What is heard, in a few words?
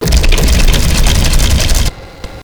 claw strike; svencoop